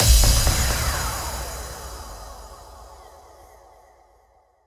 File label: EDM Tr1 OUT